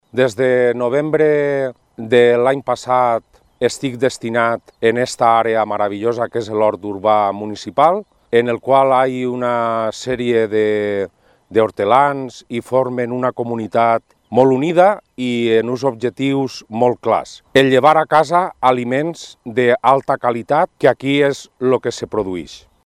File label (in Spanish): CANTO